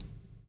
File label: PULL; Spectrasonics - Backbeat